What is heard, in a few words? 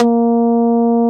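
Guitar Mute D2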